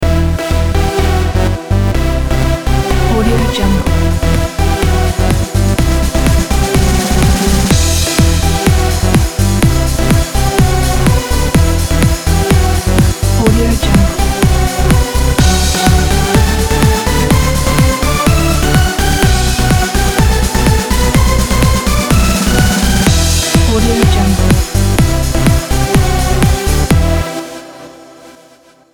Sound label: house pop ident